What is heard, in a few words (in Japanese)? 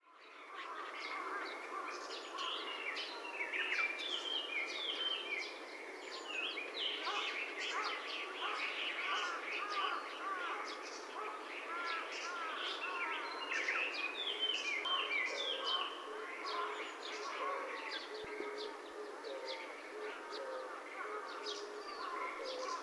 オオムシクイ 地鳴き; 北本市